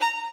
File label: solo